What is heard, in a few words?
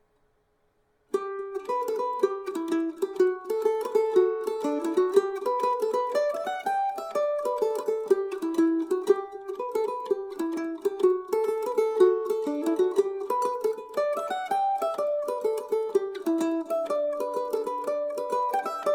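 G major, Irish mandolin; Get Up Old Woman And Shake Yourself; Girouard Concert Oval Hole mandolin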